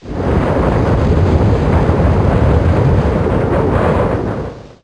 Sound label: SWORD; legend of wolf windows 1997